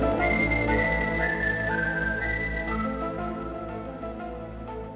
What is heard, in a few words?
whistle; xfile